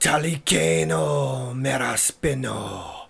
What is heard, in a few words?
healer sacrifice, spells; wizardwars